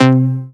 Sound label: synth note analog C, one shots; Virtual Riot; analogboi